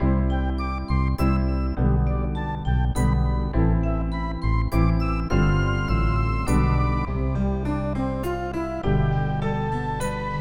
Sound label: Instrumental